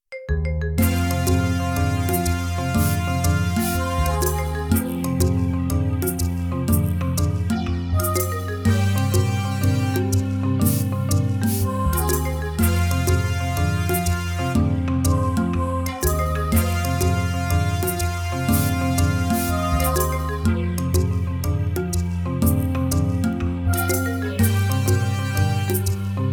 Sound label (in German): Musik; Köpfchen mal vier; Cornelia Funke erzählt von Bücherfressern